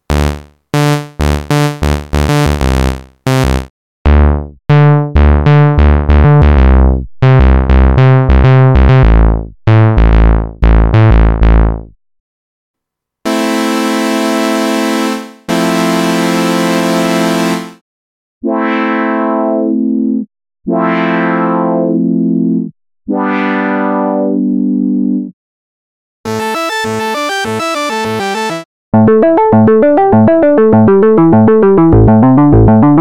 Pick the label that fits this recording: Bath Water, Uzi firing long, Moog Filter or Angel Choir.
Moog Filter